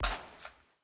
Gamepad Drop; game audio; Mita; MiSide

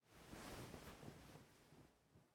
Movement; MiSide

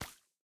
Step Blood; Game rip; MiSide

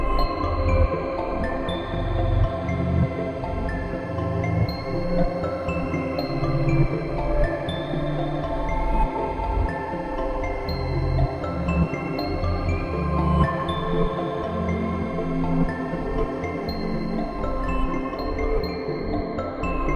Cloth Music; Game rip; MiSide

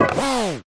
Chainsaw; MiSide

